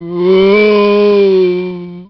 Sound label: zombie die, male